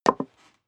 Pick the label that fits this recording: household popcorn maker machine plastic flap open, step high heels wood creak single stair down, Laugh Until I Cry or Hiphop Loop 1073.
step high heels wood creak single stair down